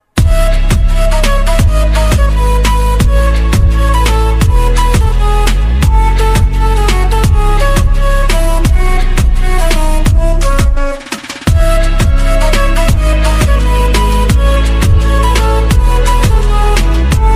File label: pure flute; نغمة موبايل موسيقى حزينة; sad ringtone